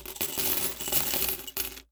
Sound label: money; NewGamePhysics; MontyHallGame